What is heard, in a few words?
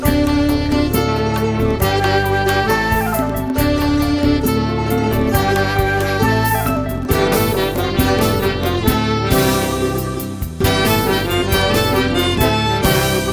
Saxophone Clarinet